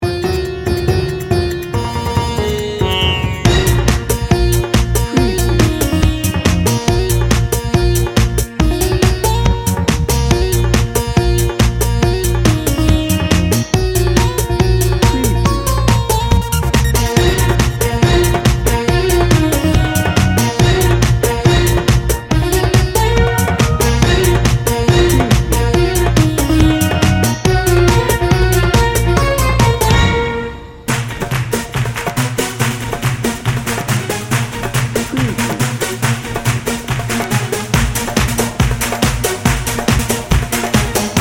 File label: bollywood bash no vocal